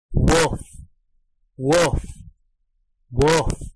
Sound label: woof woof woof